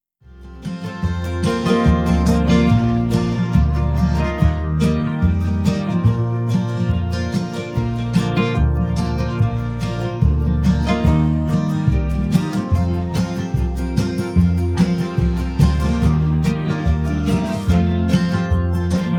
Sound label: instrument